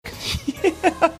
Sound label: giggling yeah